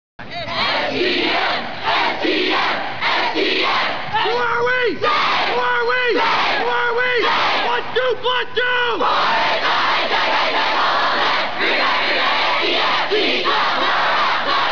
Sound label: cheer